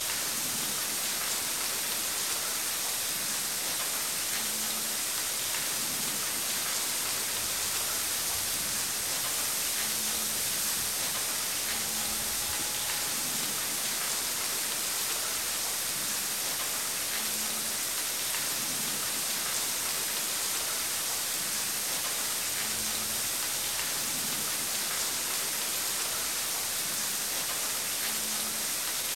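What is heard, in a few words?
Scottish train local interior; the 39 steps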